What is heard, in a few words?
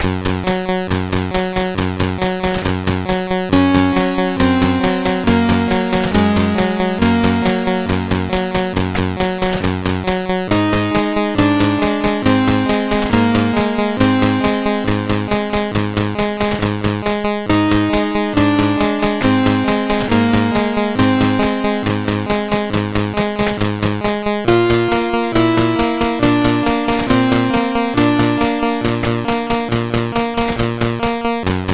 Unknown Sound, sid CSG8580R5